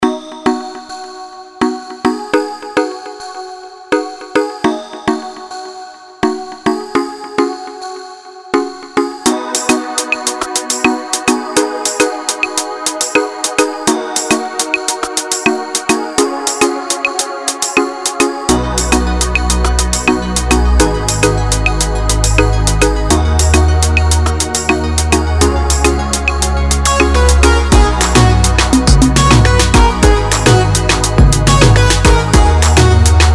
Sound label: Dancehall